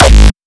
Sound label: hardcore kick